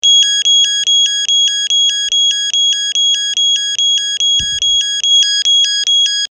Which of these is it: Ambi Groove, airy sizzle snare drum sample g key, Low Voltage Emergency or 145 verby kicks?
Low Voltage Emergency